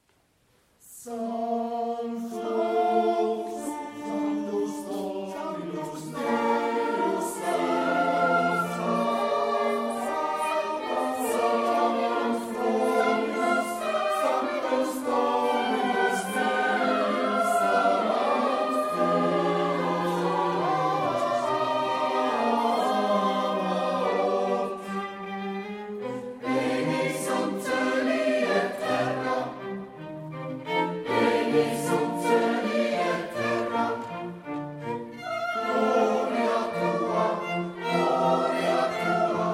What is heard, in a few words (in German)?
Sanctus, Orgelsolo Messe; Joseph Haydn